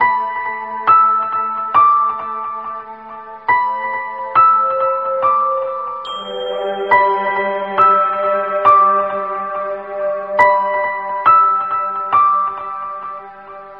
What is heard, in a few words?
piano, Loops Sad